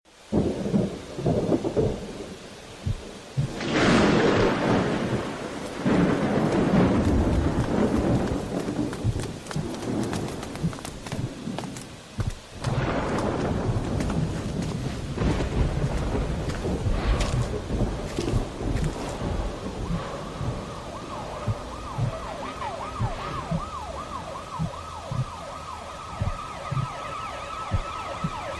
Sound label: Summer storms